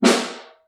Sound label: SN1 Far, Snare; Ableton Foundation FreePacks; Snare Designer